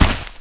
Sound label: steps; the monster plane; kolmods